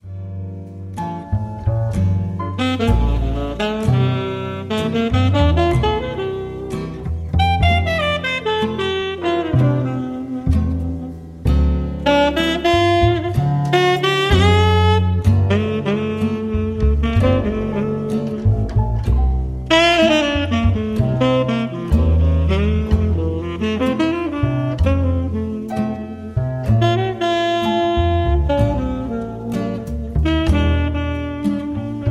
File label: Jazz Lounge